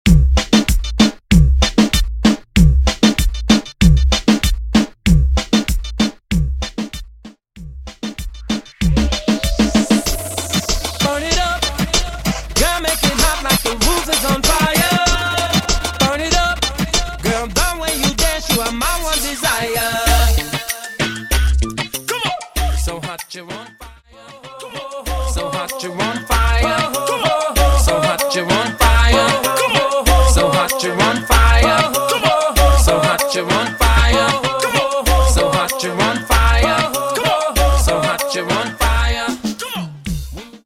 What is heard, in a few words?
Version 96 BPM